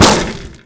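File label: grass; Mafie 1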